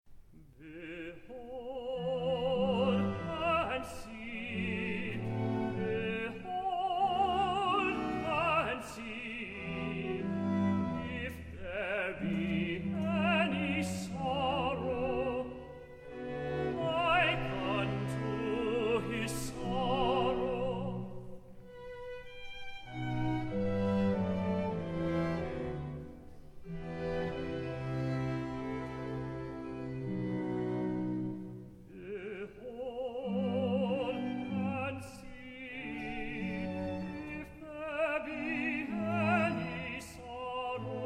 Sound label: concert choir air for tenor; behold and see there any sorrow